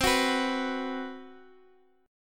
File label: B 5th C, chord; B5/C; strummed; JGuitar